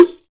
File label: Rek Dom; Roland BK-5; Percussion